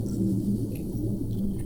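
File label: Music Dpad Evaporate 145; from dust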